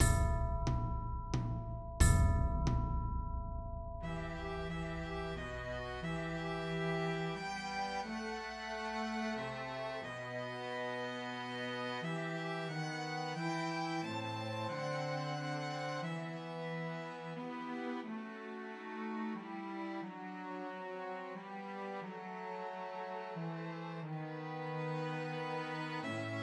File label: Christmas Trios for Two Violins and Cello; Coventry Carol